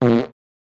Cartoon Farts 4 Mediu

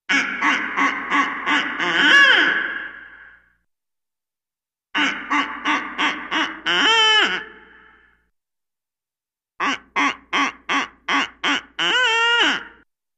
Barred Owl Or Duck Qu

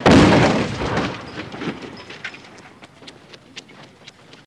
Auto Crash Wheel Spi, car crashes

